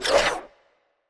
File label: space tile flip back